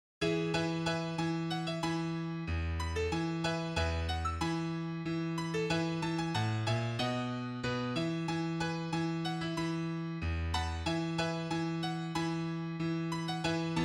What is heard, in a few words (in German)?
Klavier